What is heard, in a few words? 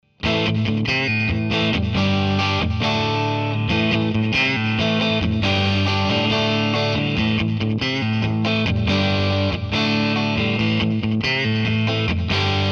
mid neck r